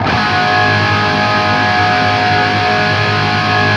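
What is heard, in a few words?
power chord G# loop cut, guitar; East West; Steve Stevens Guitar Samples Collection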